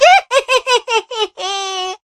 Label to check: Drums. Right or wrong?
Wrong